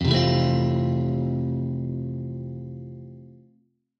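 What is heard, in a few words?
F maj, Guitar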